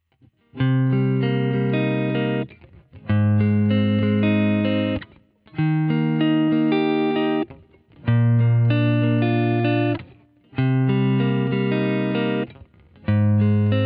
gt clean cable arp solo

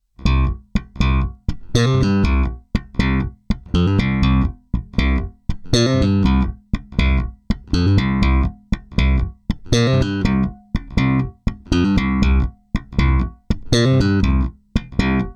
direct bass; rme comparing; ucx2

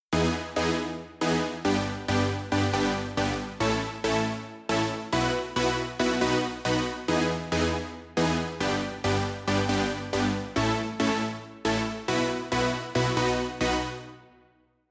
layered sound synth stab

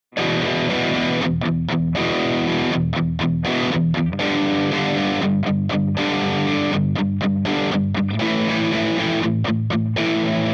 gt drive cable backing

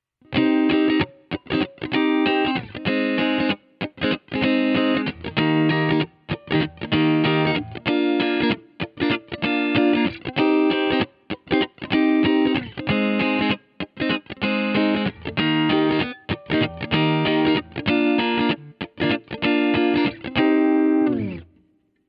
gt clean cable funk solo

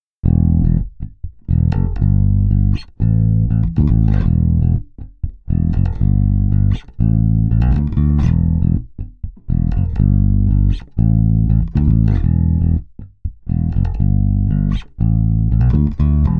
interface direct; rndi

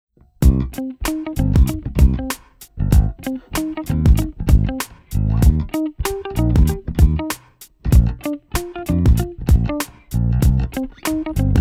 gt clean cable mute 2mix

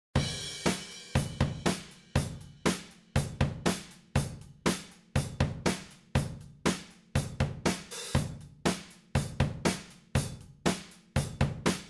bfd3 amb

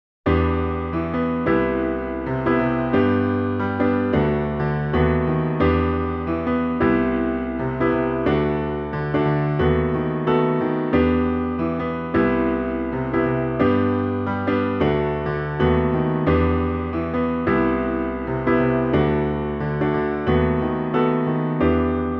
sg solo, ivory2

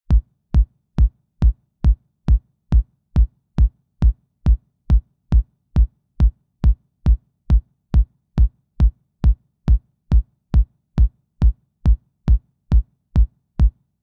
layered sound kick 909

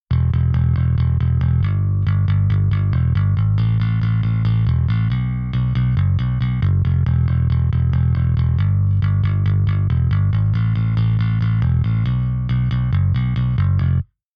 layered sound bass amp

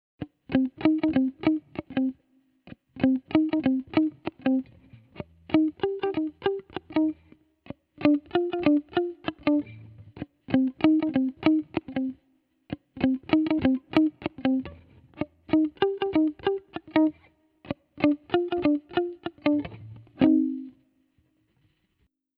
clean cable mute solo

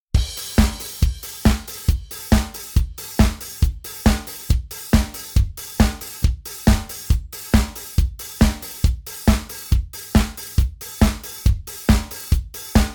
layered sound drums nonlayered

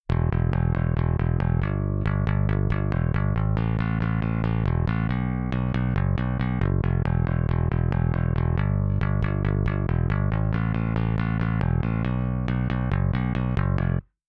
layered sound bass di

